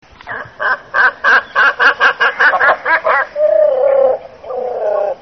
cormoran commun

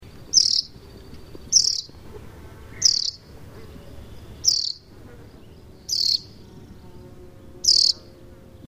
bruant proyer

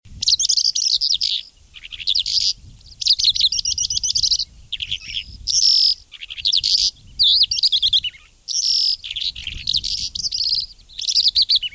alouette calandre

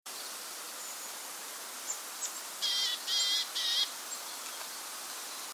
mesange boreale

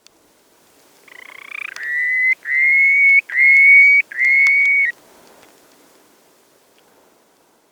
becasseau violet; chant du bécasseau violet; (tri/)*; tr(i/).tr(i/).tr(i/)...

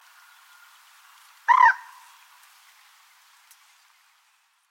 gallinule poule d eau

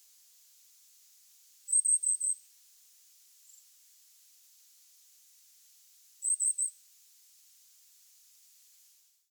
roitelet huppe

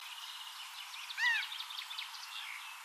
pic mar

